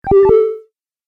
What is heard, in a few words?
Classic Lo-fi Magic Drop, video game sound effects